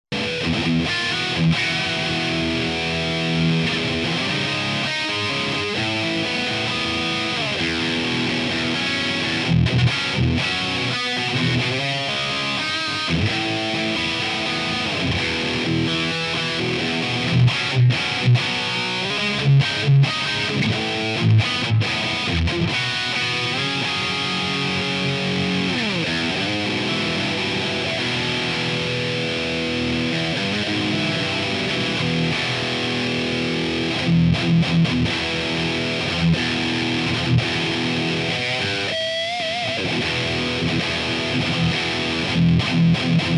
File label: C Quarta DSL100, Lead